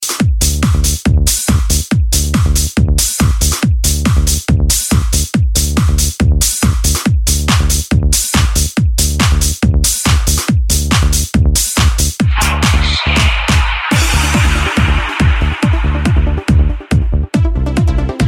3D drums

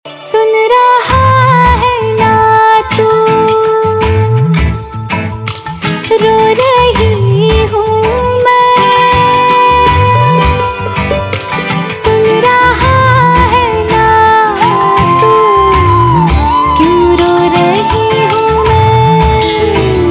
Female, Ringtone, Bollywood; Aashiqui 2

